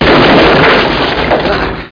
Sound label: Crash Big